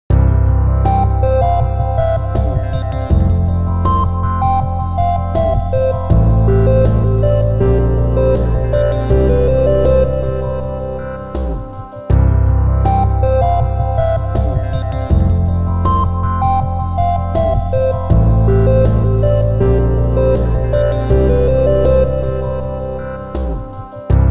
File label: Level Completed!; runner3